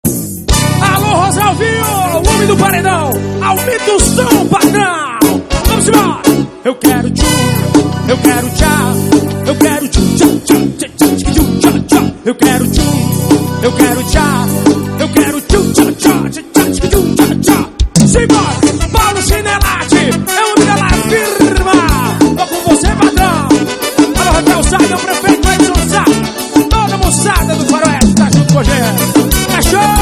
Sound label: forro